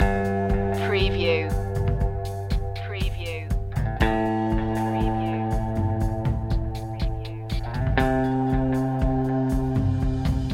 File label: mountain mover